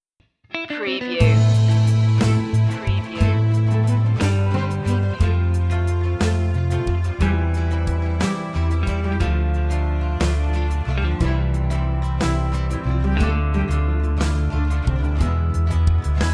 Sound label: spanish goodbye